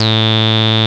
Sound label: TB 303 BASS